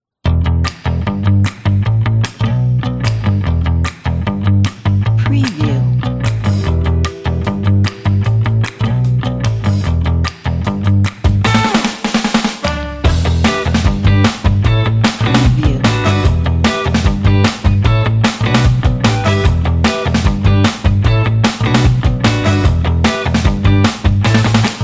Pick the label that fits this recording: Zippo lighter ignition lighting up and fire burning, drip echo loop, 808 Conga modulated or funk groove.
funk groove